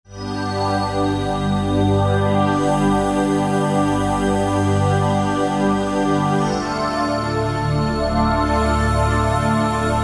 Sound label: Super Pad, Korg TR-Rack